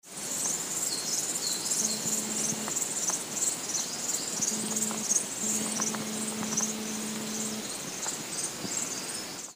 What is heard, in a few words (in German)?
Grillen Gezirpe